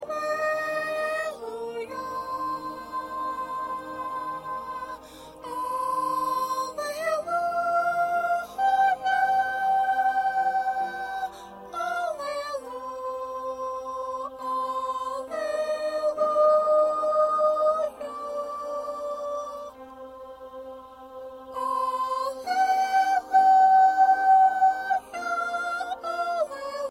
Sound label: 1st Soprano